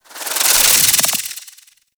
Slinky Movement Rattle; Warframe; Man In The Wall

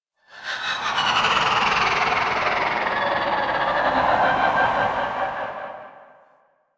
Void Connector Shriek One Off, Ambience

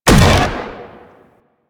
Laser Disc Fire; Warframe; Venus; Venus Bipeds; Venus Laser Disc Moa

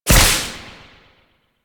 Crack Impact; Warframe; Warframes; Khora

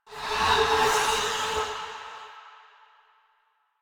Generic Foley; Warframe; Choir

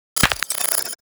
Rifle Alt Mech, weapons; Gyre

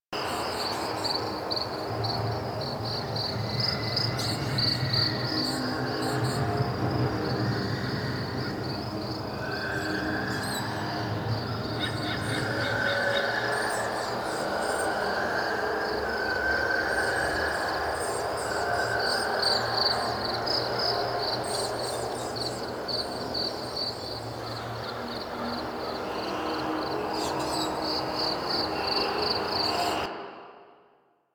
Exterior Foreground Field Sad Scared Loop; Ambience; Duviri; Warframe

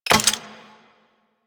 Flame Spear Charge; Grineer

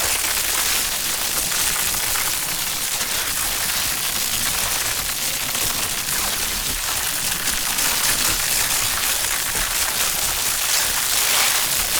Alchemy Grenade Loop Electricity; Warframe; Entrati

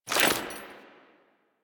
Generic Foley; Warframe; Dagath

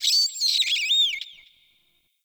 Night Tree Critters; Ambience; One Offs; Warframe; Eidolon Plains

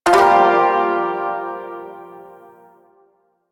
Shawzin Min Chord J, Music; Chords

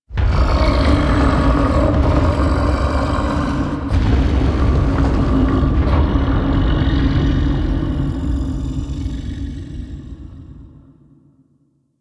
shaking lab rumble; ambience; Quest; Warframe; Entrati

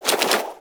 Igniter Pistol Reload; Warframe; Weapons; Corpus

